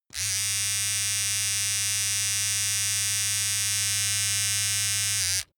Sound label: razor small long